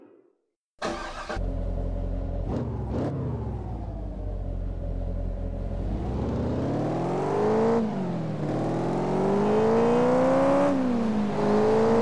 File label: chevrolet corvette c6 z06 coupe; TDU-1